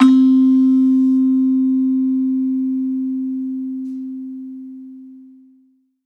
Gamelan C; baliphone; gauche

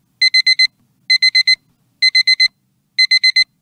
Beep Sound

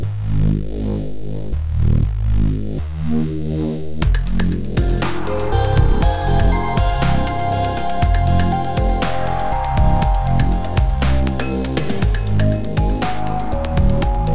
bass sound demo; tension; renoise demo; dblue

